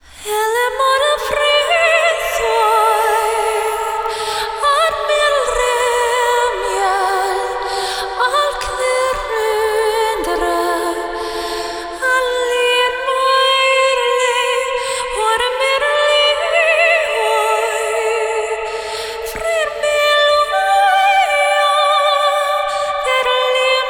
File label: Mid Section Vocal - Am 65Bpm (WET); Construction Kits; VOCALS; Ghosthack; SkyRealm; Kit 27 - The Crossing Of Jade Sea